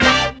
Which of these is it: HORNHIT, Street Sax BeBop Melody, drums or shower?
HORNHIT